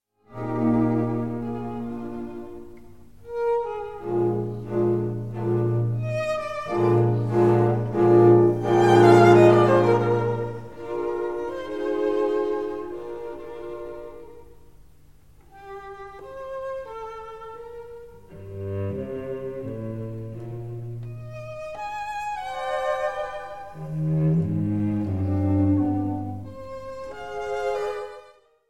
string quintet in g minor, adagio ma non troppo; Wolfgang Amadeus Mozart; 3rd mvt

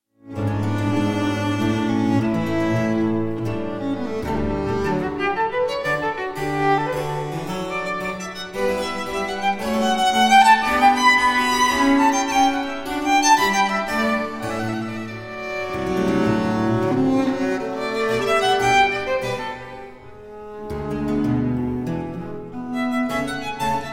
trio sonata in c minor, for 2 violins; sanguineus und melancholicus

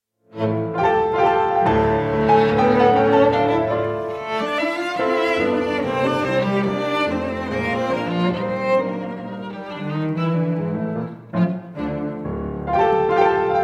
piano trio gavotte; frank bridge manchester piano trio; miniatures for piano trio set 1

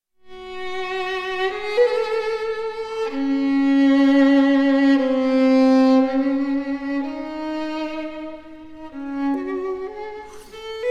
romance oubliees for violin and piano; franz liszt; s132

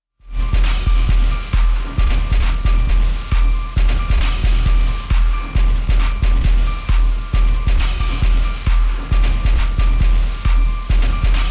drone circle

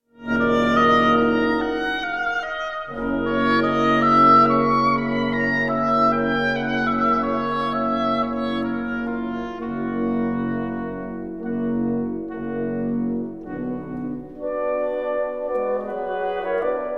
serenade in e flat major for 13 wind instruments; richard strauss; op 7

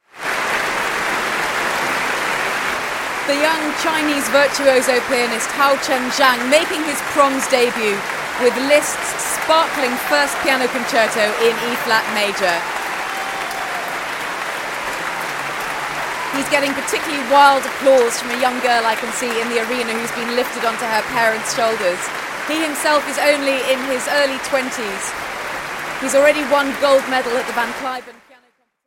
piano concerto no 1 in e flat major; franz liszt

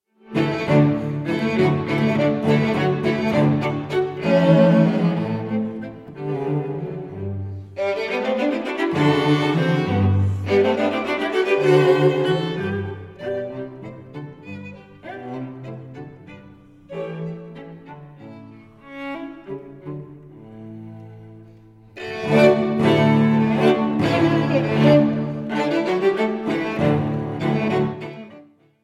trio in D major for piano and strings; Sergei Ivanovich Taneyev; op 22

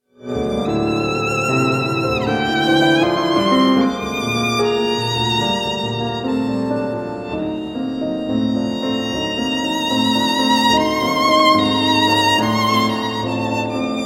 5 melodies op 35a for violin and piano; sergei sergeyevich prokofiev

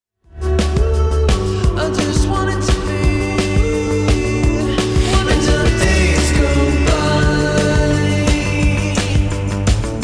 drums

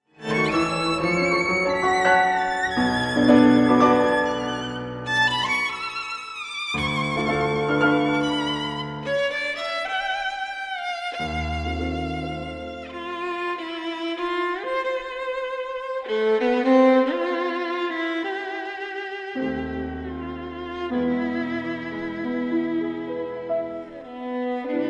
romance for violin and piano; paul barritt; amy beach; james lisney

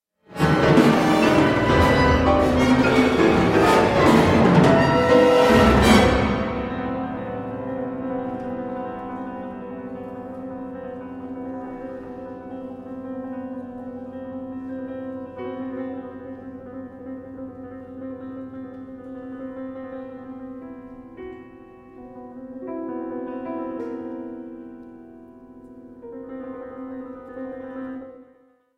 concerto for two pianos; mantovani

